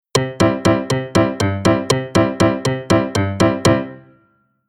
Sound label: Rhythm